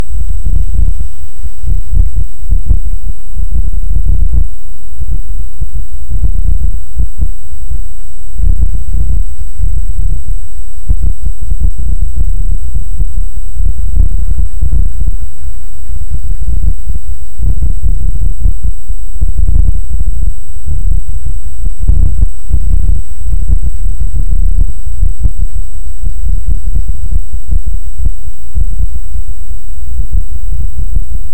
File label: Hydrophone; OneOcean